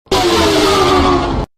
jumpscare sound; fnas 5

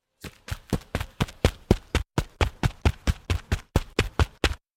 running

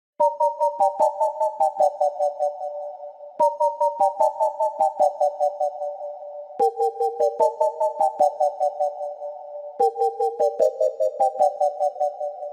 music

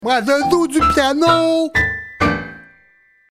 piano

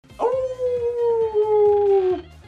awooooooooooo

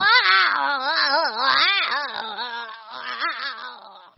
golf ball scream

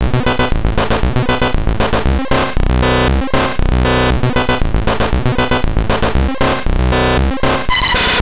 neurofunk

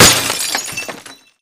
bottle breaking sound effect

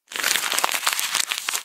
paper shuffle

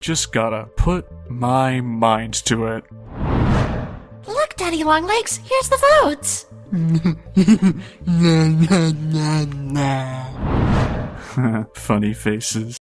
crusty ahh laugh

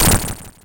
boss hit; sonic

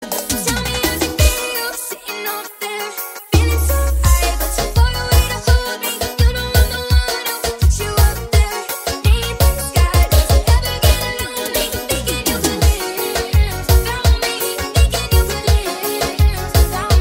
forro

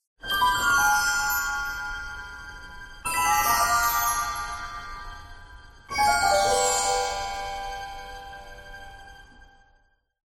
future chime long

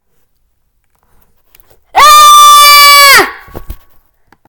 scream your ass out

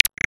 start click page shift sound; wii

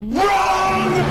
wrong

